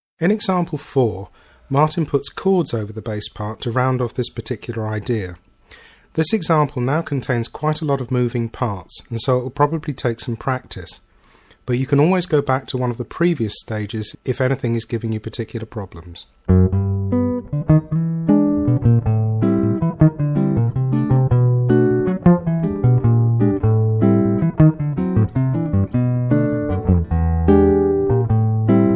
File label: Bass Line Plus Chords, Guitar